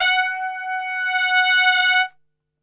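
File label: F#3 trumpet; Best Service; Brass Super Section; TRP SWL; 90 s Sample CDs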